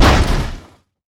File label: Touch In, Damage; DCS